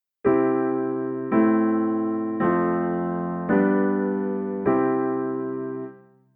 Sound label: I vi IV V I; Guided practice